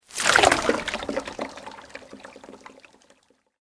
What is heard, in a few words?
Sweep Organic Medium